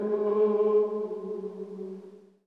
Male Choir; Armello The Bandit Clan

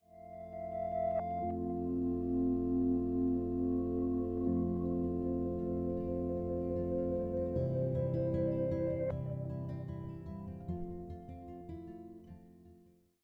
music exit outro; ChloeRoom ExitOutro

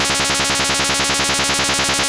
Double Team; Pokémon SFX Gen 2 Attack Moves; GSC

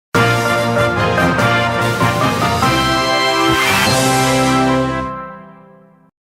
Victory Fanfare; Kono Subarashii Sekai ni Shukufuku wo; Fukatsu no Beldia

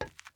glass step; teardown; ps5 windows xbox series xs

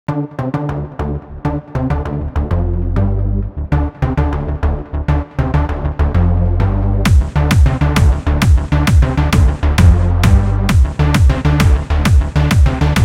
Funk Intro; Funklift

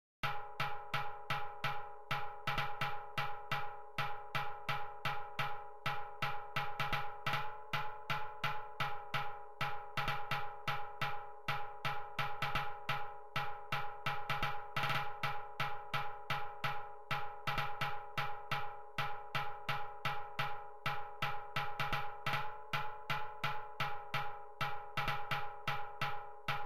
PERC; rain world

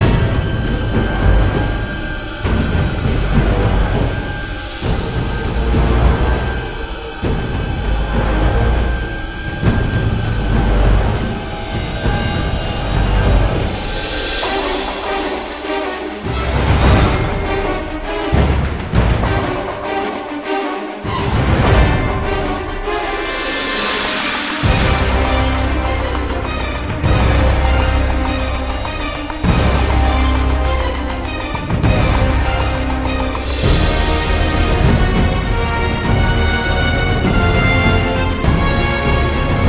Cave Theme; Marlow Briggs and the Mask of Death

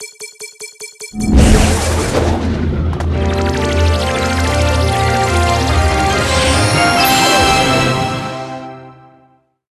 End button; jett rocket; wiiware wii

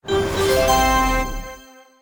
levelup; VR Karts

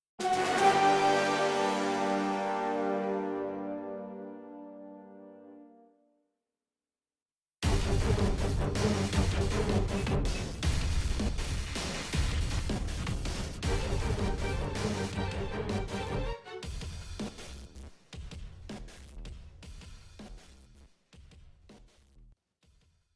cutscene music; Battletoads original soundtrack 2020

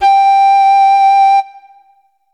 Instrument samples; peppa pig world adventures

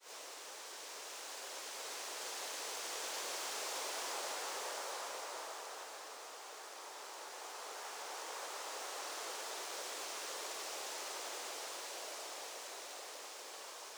Env Breeze Lp; Pokémon Mystery Dungeon Rescue Team DX

